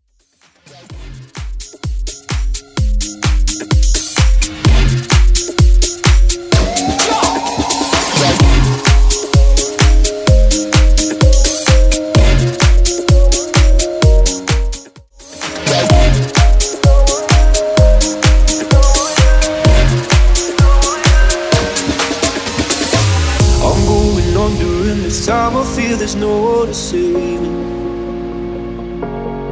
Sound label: Bass House Banger